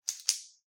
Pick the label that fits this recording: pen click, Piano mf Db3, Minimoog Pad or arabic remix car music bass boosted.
pen click